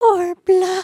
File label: beg